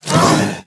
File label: Spider Grunt General Scream Start Combined